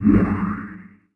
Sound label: spectral